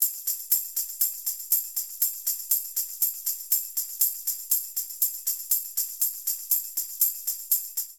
Jazz Funk B Tambourin 120bpm, Latin Percussion; Ableton; Factory Packs

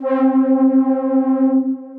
Eighty Four Blue Pad, Synth Pad; Ableton; Factory Packs; Synth Essentials

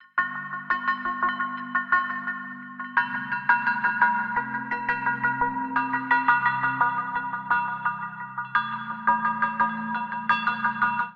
Bell Delay 86 bpm; FX; Tonal; MIDI Clips; Drive and Glow; Ableton; Factory Packs

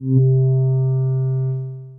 Synaptic Floater Pad; Synth Pad; Ableton; Factory Packs; Samplification

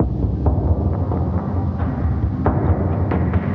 Glacial Tundra Kit - 135 bpm, Drums; MIDI Clips; Ableton; Mood Reel

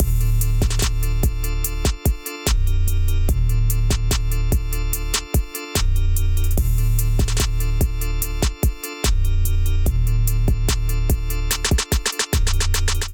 Watcher Kit groove 73 bpm; MIDI Clips; Ableton; Factory Packs; Beat Tools

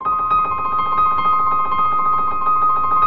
Phrase Tremolo C Minor 78bpm, Grand Piano; MIDI Clips; Ableton; Factory Packs

